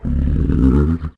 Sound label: growl, Dino